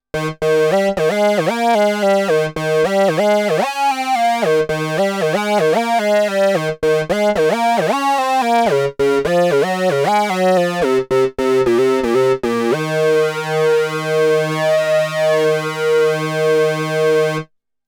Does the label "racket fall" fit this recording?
No